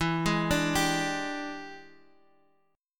E 7th Sharp 9th E down Bass Standard; arpeggiated; chord

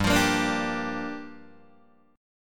G Minor 7th, Guitar, DADGAD

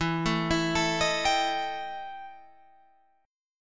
E Minor Major 7th Double Flat 5th E Guitar Open G

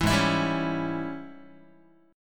G sharp Major 7th Suspended 4th Sharp 5th, Guitar; 7,4,7,5,5,x; JGuitar

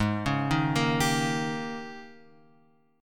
G sharp Major 7th Suspended 2nd Suspended 4th; G#M7sus2sus4 Chord {4 4 1 3 X 3} arpeggiated

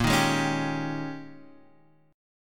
G sharp Major 7th Suspended 2nd Suspended 4th; x,1,1,1,2,3

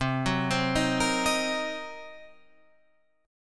C Minor 7th Flat 5th, chord

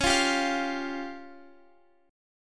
C sharp Major Flat 5th, Guitar, Open F; strummed; chord; x,x,13,12,10,12; JGuitar

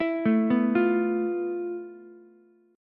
F Major 7th Flat 5th Ukulele; arpeggiated; JGuitar

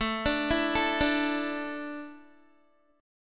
A Suspended 4th, Guitar; Asus4; arpeggiated

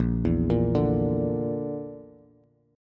A sharp Major Flat 5th, Bass; arpeggiated

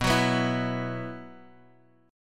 B Suspended 4th, Bass Standard; Bsus4 strummed; JGuitar

